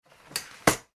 household chair folding, Folding Dining Chair Being Folded; Folding Dining Style Chair Folding Up; Chairs; McKinney Sound